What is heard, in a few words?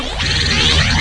ammopak; tfc